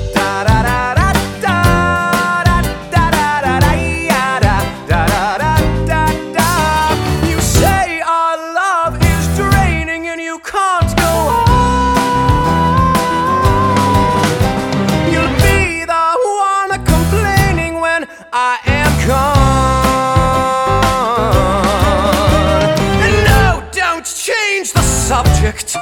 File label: vocal